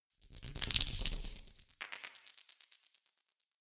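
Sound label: organic scuttle short, science fiction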